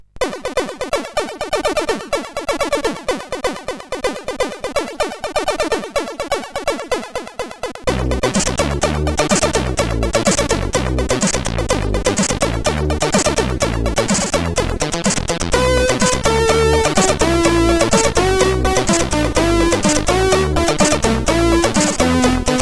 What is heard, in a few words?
Unused, CSG8580R5; sid